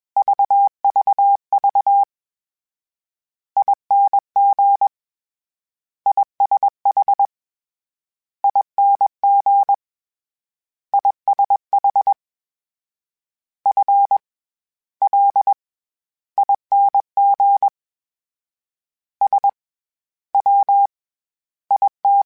Morse Code, CW Code Groups; Mixed

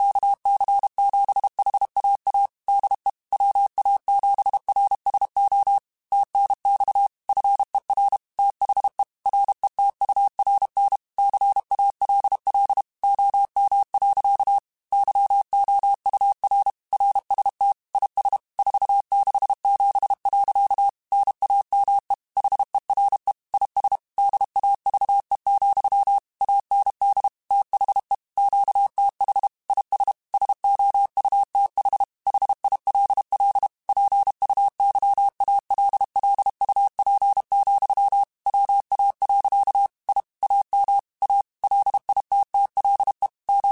Morse Code, QSOs

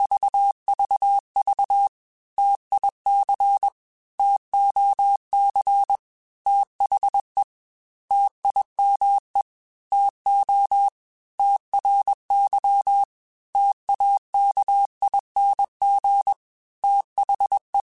T Runs, Morse Code; CW Code Groups; Mixed; Single T or H; HamRadio; Prefixes

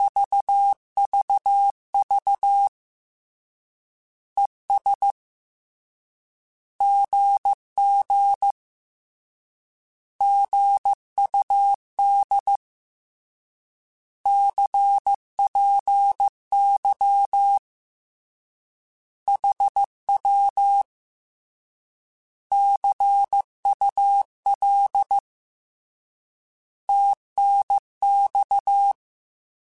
Words, Morse Code; Mixed; HamRadio

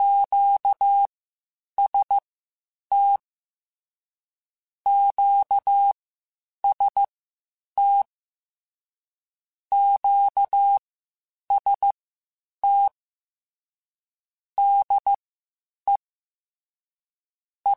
QST QST, Morse Code; Mixed; HamRadio

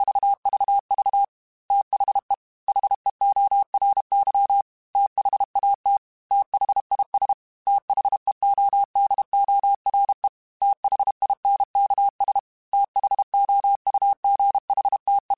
TH Runs, Morse Code; CW Code Groups; Mixed; THs; HamRadio; Prefixes

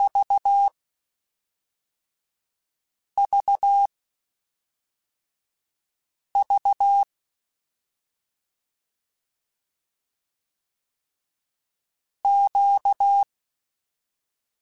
Q Calls Twice spaced, Morse Code; HamRadio

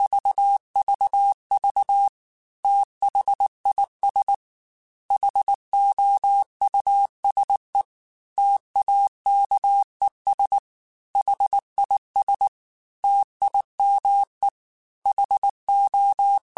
T then H Runs, Morse Code; CW Code Groups; Mixed; Single T or H; HamRadio; Prefixes

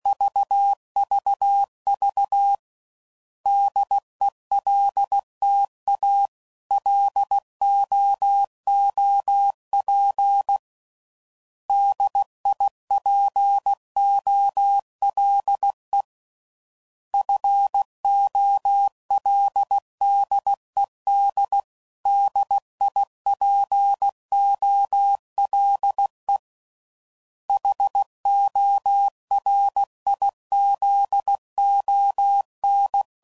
Antennas, CW Code Groups 15WPM; Morse Code; HamRadio

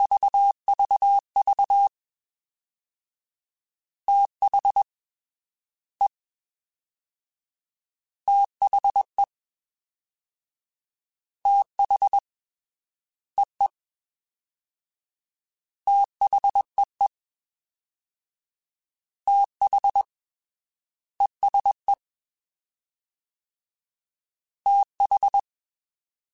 Fast TH, CW Code Groups; Mixed; Prefixes

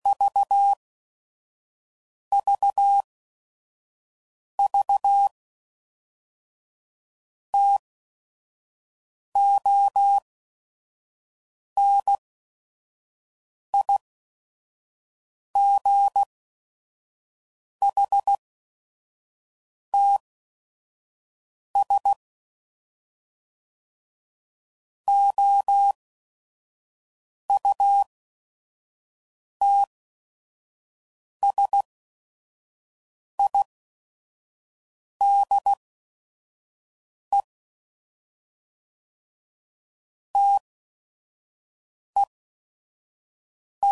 CW Code Groups 10 WPM, Weather

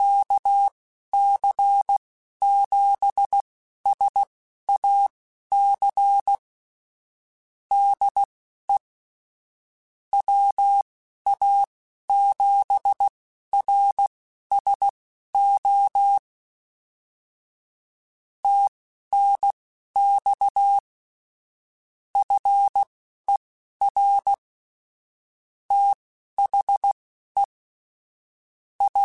Morse Code, QSOs

